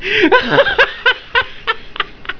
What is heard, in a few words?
cackle